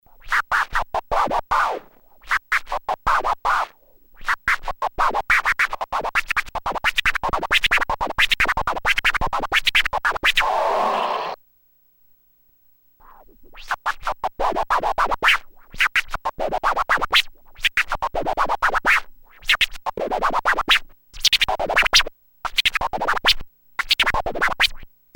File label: some kinda scratch